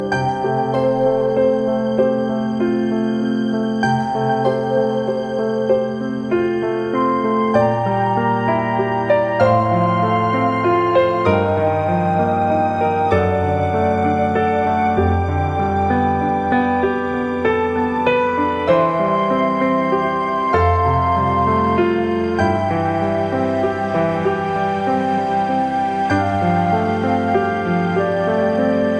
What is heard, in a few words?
samsung galaxy s4